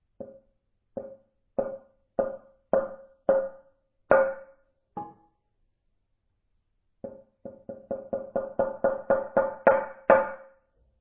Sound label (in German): holzgong